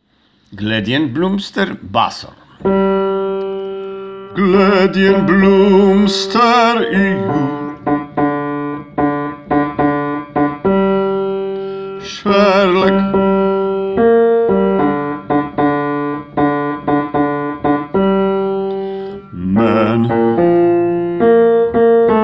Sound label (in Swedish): bas